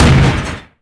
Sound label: tank fire; Rabbit-Wars